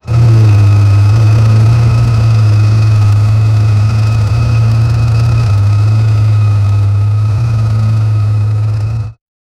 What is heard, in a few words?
massive industrial drill, mining